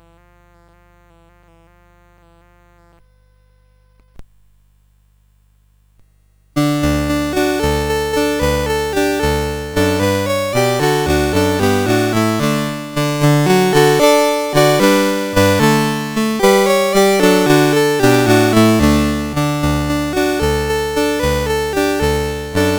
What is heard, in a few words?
CSG8580; CSG8580R5